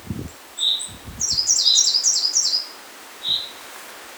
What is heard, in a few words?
coal tit song; Coal tit (Sosnówka)